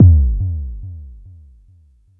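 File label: Mod bass drum, Jomox Airbase99, Drum Machines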